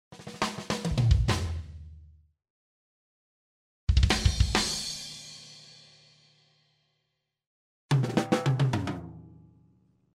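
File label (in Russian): три группы барабанов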